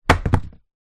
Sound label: household pool noodle float tube down on hard ground